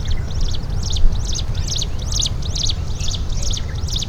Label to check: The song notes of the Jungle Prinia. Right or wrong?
Right